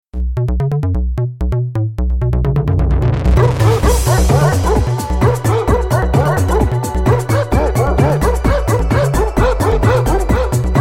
barking

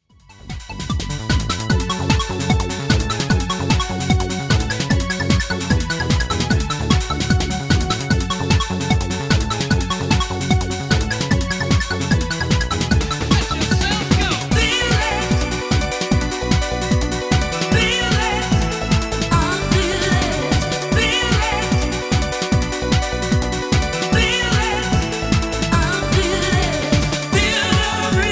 piano

